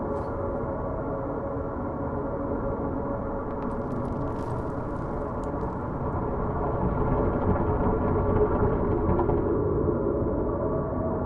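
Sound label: ambience exterior Above The Dome Small Rock Tunnel; Boomtown; Titanfall 2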